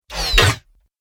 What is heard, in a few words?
Sci Fi Impact; Tech Warrior